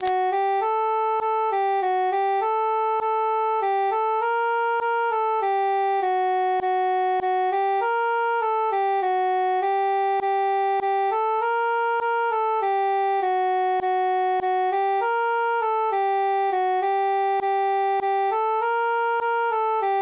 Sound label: altus solo